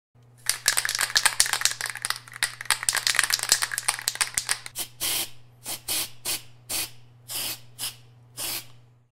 Shake spray

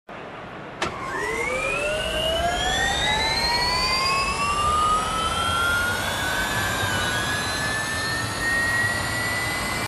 lotus evija, اگزوز; لوتوس اوایا

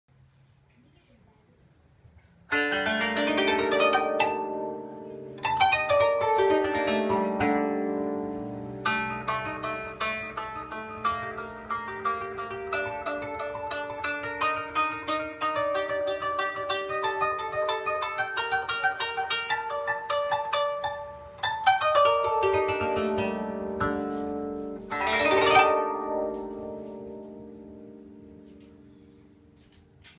Koto, ساز ژاپنی

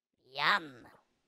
yum yum

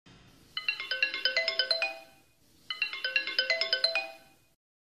blackberry ringtone

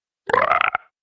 Strider; استرایدر در ماینکرافت

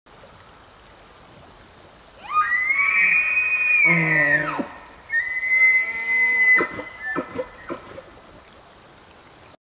Elk; واپیتی

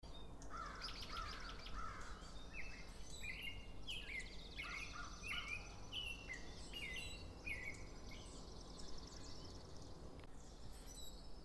birds of spring northern maine

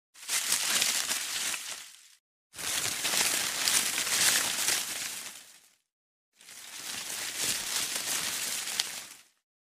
bush

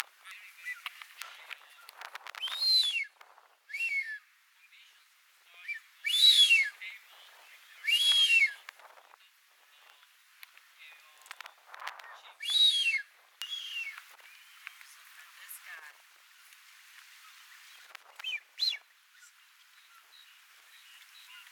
Blue footed booby; پرنده بوبی پاآبی

